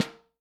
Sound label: SH, Vintage Snare Drum; Q Up Arts; Johnny.C's Vintage Snare Drum; 5.5X14 SLR